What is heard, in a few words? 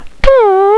quietsc